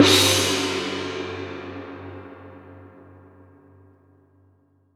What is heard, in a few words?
CHINESE GONG; AKAI S-Series CD-ROM Sound Library VOL-5